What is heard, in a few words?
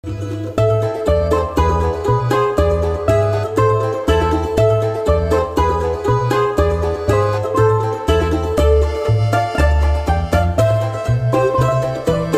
balalaika